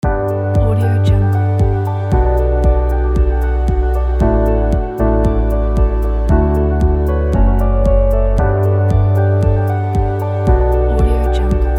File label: calm inspiring corporate background loop